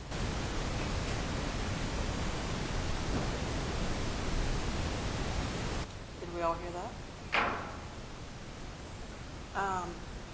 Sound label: Courthouse Footsteps